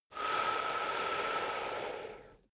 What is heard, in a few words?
male breath slow out